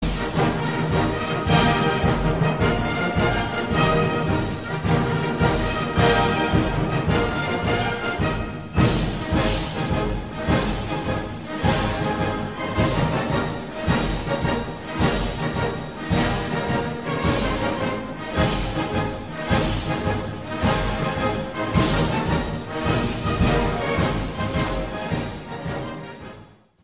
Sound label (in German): Koeniggraetzer Marsch